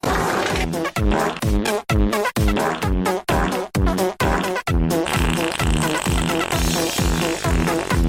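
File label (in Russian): музыкальный пердёж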